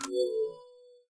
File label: ui select rotate